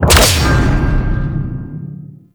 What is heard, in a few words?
Dash Hit; Monolyst; Warframe; Uriel